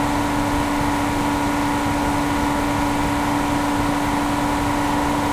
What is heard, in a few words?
Valentine engine rpm 66